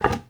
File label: Vase Medium Impact